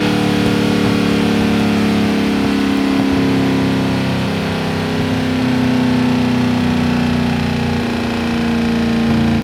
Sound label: slowdown, vehicles cars; lwcars